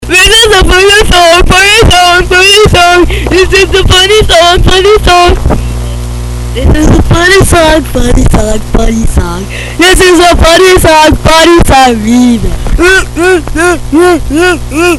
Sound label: funny song